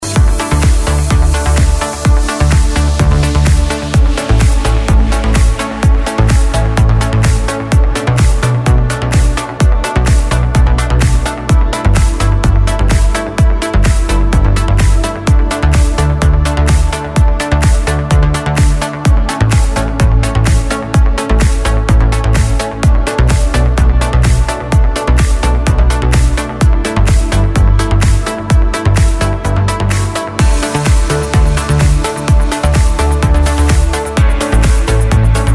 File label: progressive eletronic music